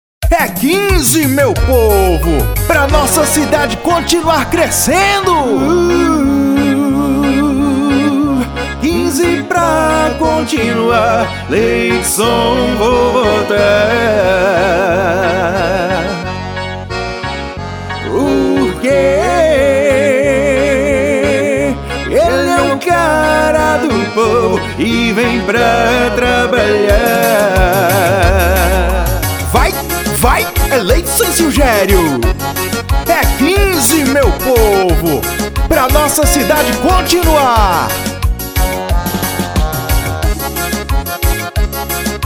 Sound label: JINGLE